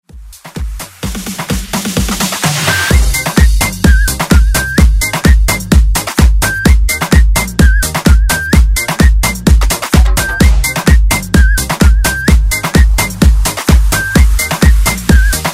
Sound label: Afrobeat; Redrum